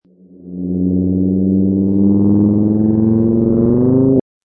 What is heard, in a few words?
Low Rise